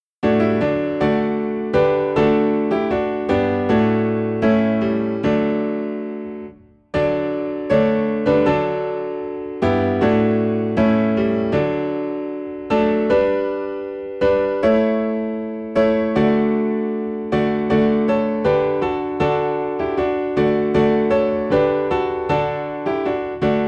Piano in D

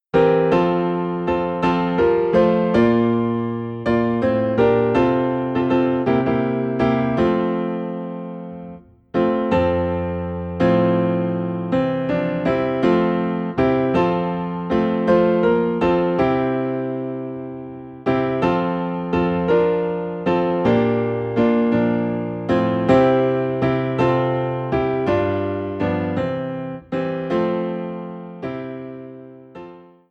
Piano in F; We're Marching to Zion

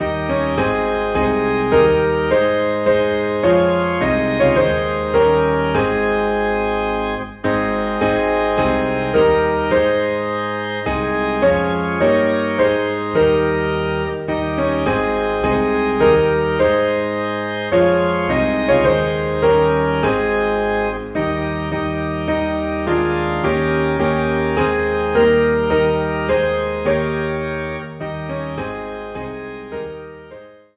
Piano and Organ in A Flat

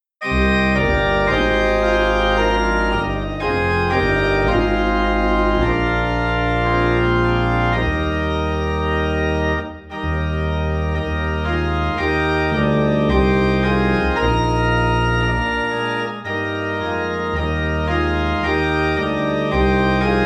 Organ in E Flat

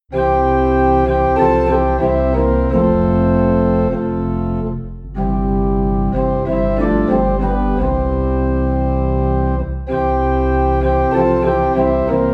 Organ Low in C; What a Friend We Have in Jesus

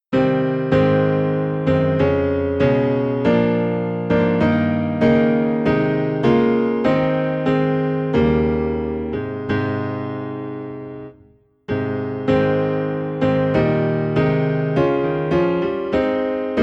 Piano Low in A Flat; Almighty Father Strong to Save

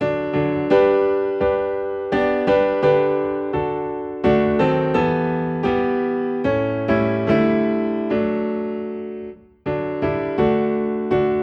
Piano in D; Children of the Heavenly Father

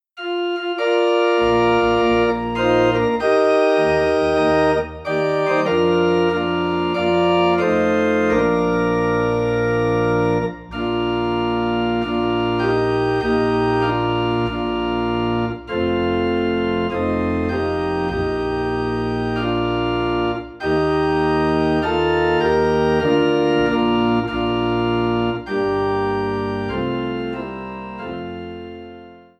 Organ in B Flat; Christ Arose

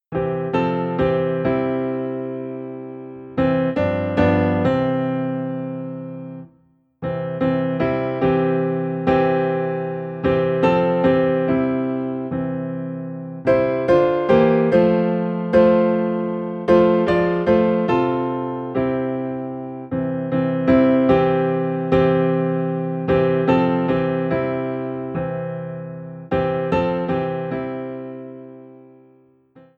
Piano in C

